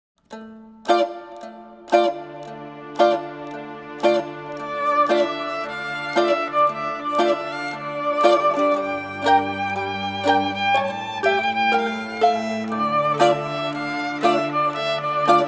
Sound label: wind and rain instrumental